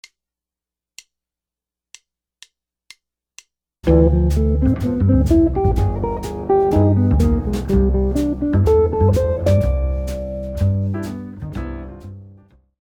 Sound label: altered scales, guitar